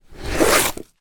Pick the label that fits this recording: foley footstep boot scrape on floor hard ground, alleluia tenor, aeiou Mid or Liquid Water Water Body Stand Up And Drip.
foley footstep boot scrape on floor hard ground